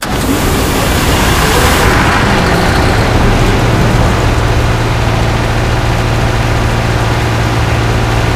crane start loop; source engine; portal2

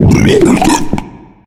male boomer warning; player; voice; source engine; left 4 dead 2

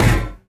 physics turret impact lg

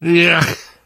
reaction disgusted, survivor voice; source engine; left 4 dead 2; namvet

